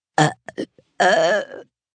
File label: Burp Multi Gasp, Burp, 1 child, several, comical, human voice, kid; Burping